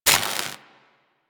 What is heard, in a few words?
Worker Welder Start; Grn Worker Welder